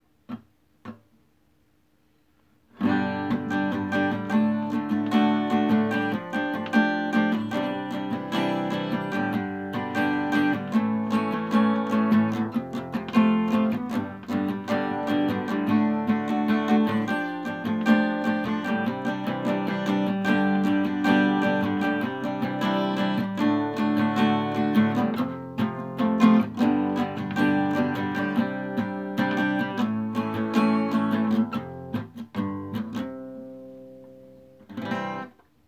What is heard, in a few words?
acoustic; usa rebuilders